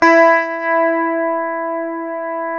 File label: GTR, Clean E.Gtr, Death Strat; Roland LCDP02 Guitar and Bass